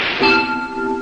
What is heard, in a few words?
Ting